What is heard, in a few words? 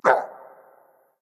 hitreact human male small